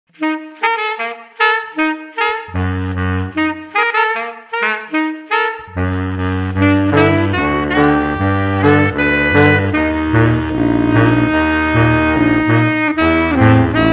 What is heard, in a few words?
sax